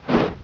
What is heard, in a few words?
swing heavy